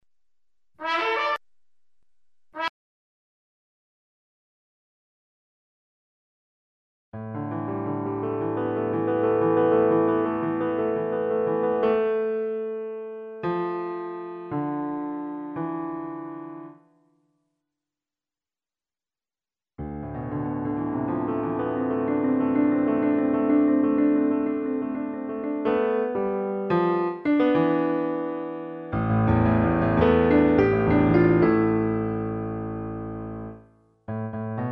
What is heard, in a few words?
Sonata Trumpet Piano Only